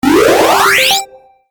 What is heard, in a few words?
High Speed Bonus, video game